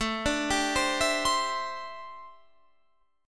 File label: D 7th Suspended 2nd Suspended 4th A